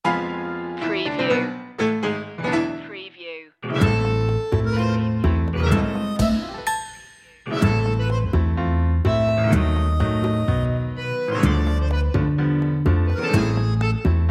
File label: no violin or piano melody